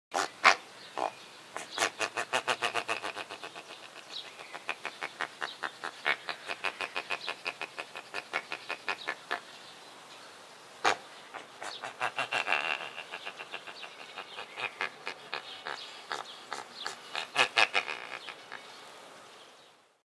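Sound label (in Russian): kriki samca, charadrius placidus; animals; vertebrata; aves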